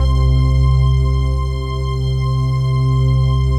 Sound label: PAD, Dance Mega Synth